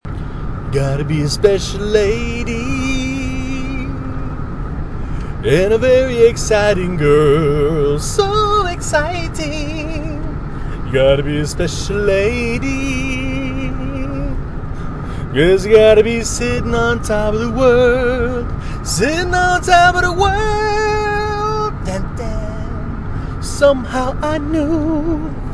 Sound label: singing while driving